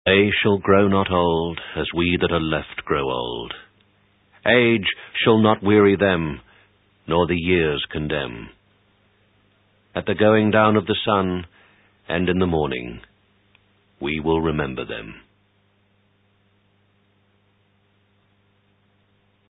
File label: reveille